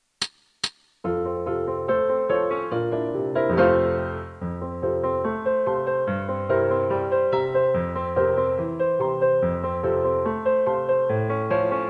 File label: Dance Song accompaniment